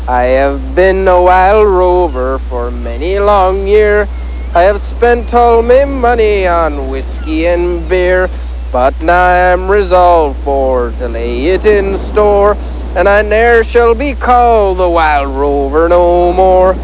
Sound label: sing